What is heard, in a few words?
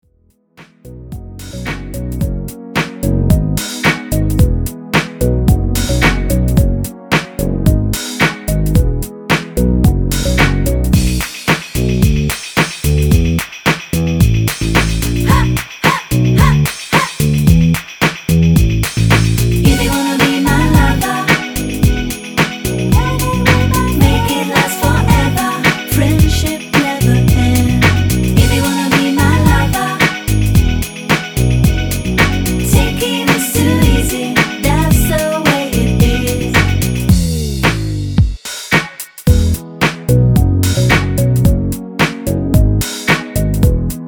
o Piano m Backings